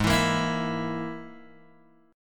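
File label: Ab Suspended 2nd Sharp 5th chord, Guitar, DADGAD tuning; strummed; JGuitar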